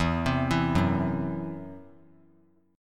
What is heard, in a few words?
E Suspended 2nd Sharp 5th, Esus2#5 chord; arpeggiated; 16,19,19,16